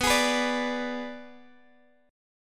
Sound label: B Minor 6th B, Guitar Open G